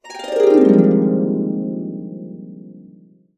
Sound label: Harps; USB Soundscan vol.62 - Complete Harps; AKAI